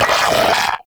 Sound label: Arachnoid Vocal Pain; Enemies; Vocals; Warframe; Corpus; Arachnoid Hunger